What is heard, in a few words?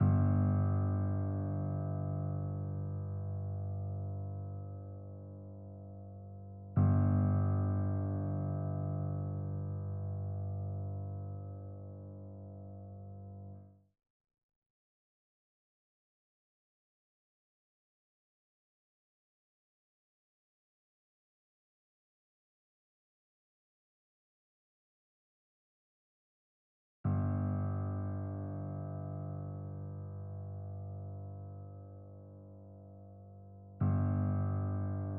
142 BPM G Min Piano, Drill; Cymatics - 2022 Melody Collection - Drill; Peng